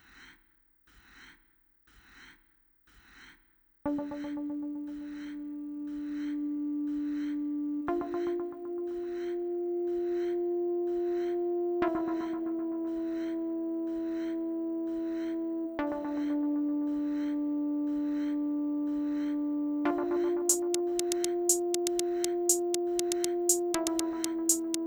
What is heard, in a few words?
noise, Korg Volca